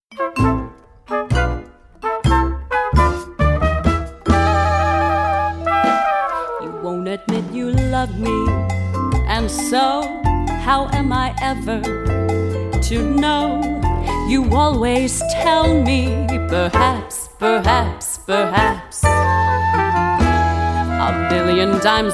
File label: Jazz Band; Seattle